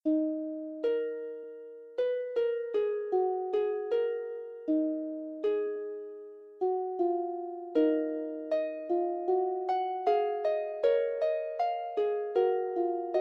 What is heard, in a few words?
Fugue, Harp